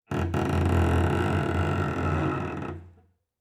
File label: Cello Scratchy Noises; SoundsCrate